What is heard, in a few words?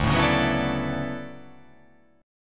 D Major 13th D down